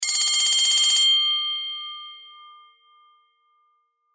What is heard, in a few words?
School Bell Ring, Foley School Bell Ring; Blastwave